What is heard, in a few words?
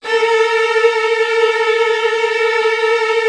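Virtuoso Strings, violin trills, A2; ILIO; Kirk Hunter Virtuoso Strings VOL-1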